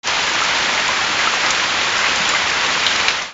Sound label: RAIN